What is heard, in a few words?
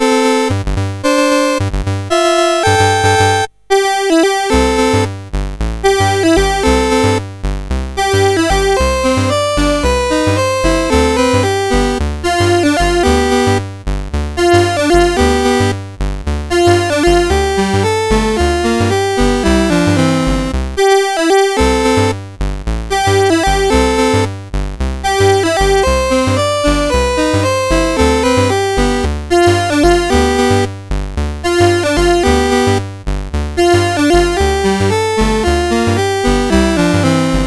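MOS 8580 SID chip; CSG8580R5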